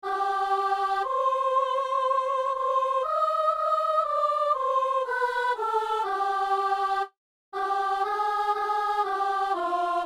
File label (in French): soliste; pour soliste et choeur